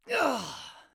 death; Paladins; Lex

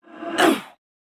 Hunter Grunt Slow; Realm Royale

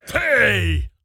Grunt Hit; Makoa; Paladins

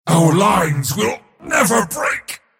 move pain; Mars

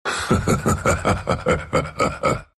laugh ru; Dota 2

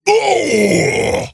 Grunt Hit; Makoa; Paladins

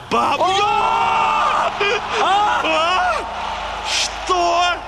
aaah aaah

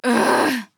Ugh; Skye

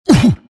bristle pain; bristleback

